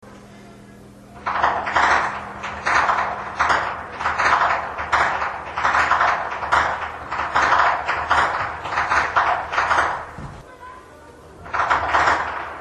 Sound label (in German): schnalzen, flachgau; steindorf